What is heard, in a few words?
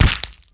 Bone Break; casualtiesunknown